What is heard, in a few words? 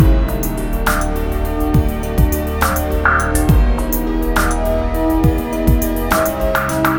loop